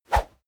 whoosh small

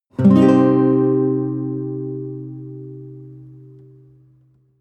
nylon guitar chord full major soft

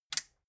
metal button push power off

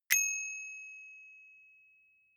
bicycle street bell

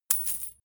coins drop soft

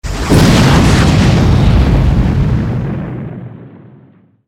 war zone big explosion

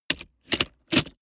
plastic latch full

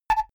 typewriter single

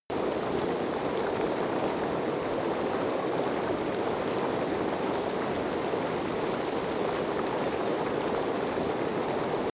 river stream distant loop

